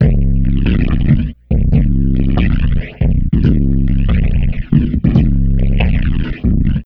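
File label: Loop, beats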